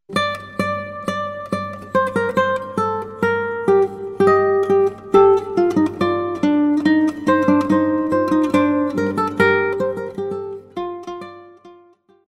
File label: Bach Fugue, rosewood